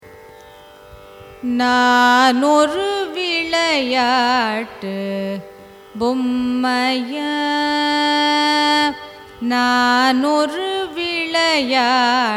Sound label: raga navarasa kanada carnatic; carnatic music